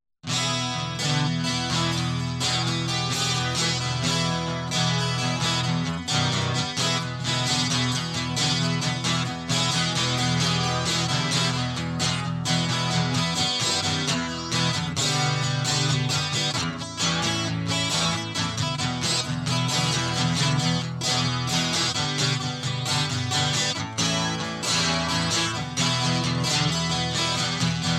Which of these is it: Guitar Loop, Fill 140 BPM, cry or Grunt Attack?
Guitar Loop